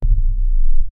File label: rapid minimal triple zoom; monochrome